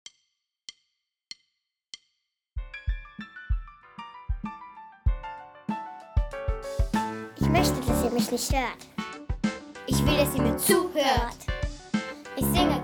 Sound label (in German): Gesang Rap